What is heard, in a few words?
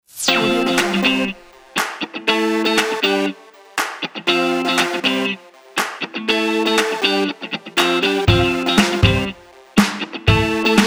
cheerful energetic indie music